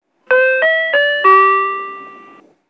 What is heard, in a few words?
citybus door open